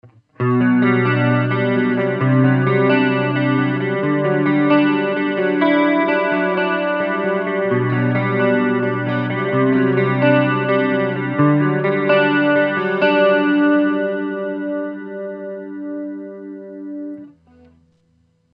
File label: jc clean + chorus; Cube 40XL